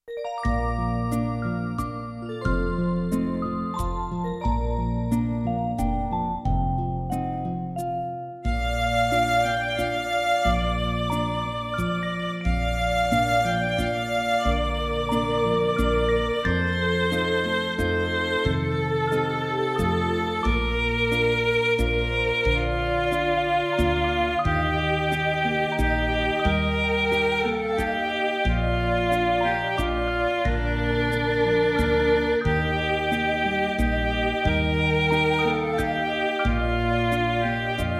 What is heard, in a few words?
Silent Night, Christmas